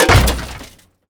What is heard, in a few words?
soundwave robot metal texture; Bluezone Corporation; Transformers